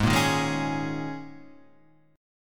Ab Suspended 2nd Suspended 4th Ab, Guitar Half Step Down